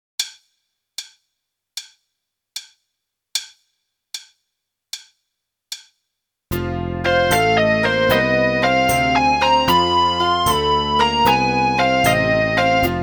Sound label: Blues